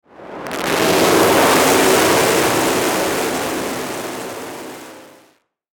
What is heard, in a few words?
Static Crackle Wind; Production Element Imaging Element Accent Transition; Blastwave; Static Sound Effects